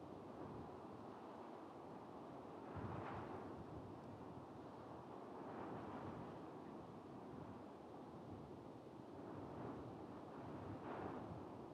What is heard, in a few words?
ghast ride, happy ghast; Minecraft